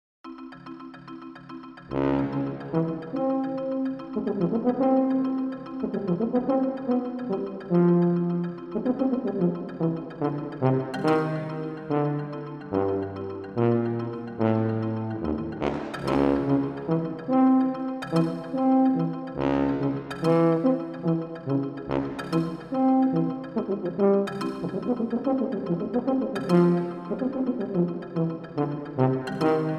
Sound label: Tuba and Marimba; Capriccio